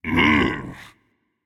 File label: ugh, voicepack; smite; jorm